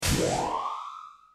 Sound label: Hollow Machine; Serious Sam The First Encounter; Forager